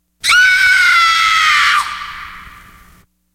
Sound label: super scream